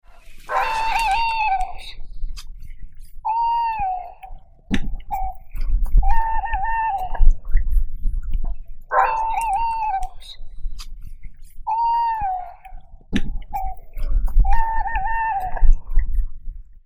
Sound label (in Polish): sowa puszczyk